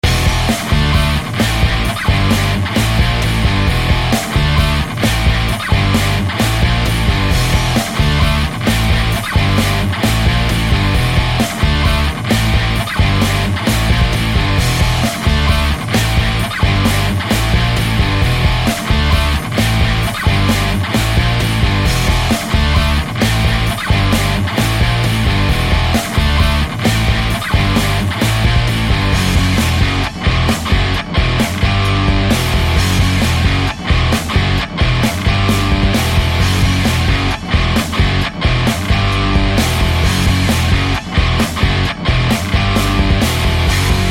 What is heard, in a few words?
neorock loop; svencoop; uboa rampage ii